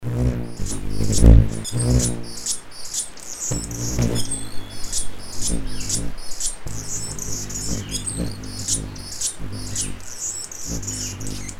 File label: Hummingbird ringtone mix